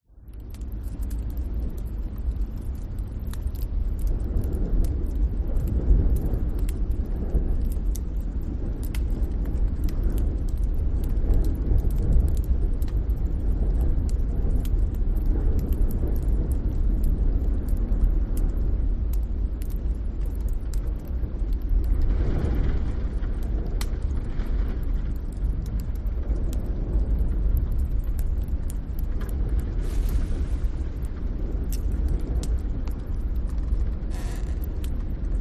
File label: ambience